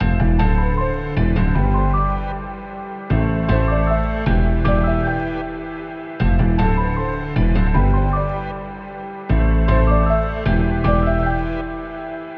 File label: athmoz 155 bpm a min; monosounds